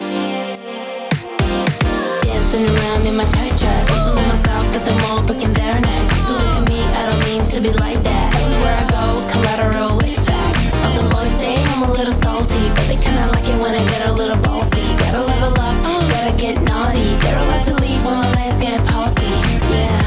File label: neutron phase off; neutron 5